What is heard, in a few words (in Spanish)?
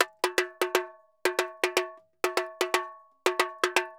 Tamborin Bomba 120, Drums & Percussion; Best Service; Festa Latina VOL-1